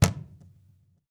CAJON; Univers Sons - Raricussions

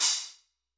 Soft Glitterbomb Long A, Hats; Airwindows

